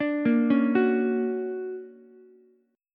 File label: D Augmented Major 7th, down, Ukulele; D+M7 arpeggiated; JGuitar